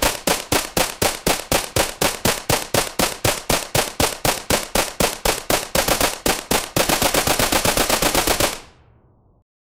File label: gun shots automatic gun; gun fire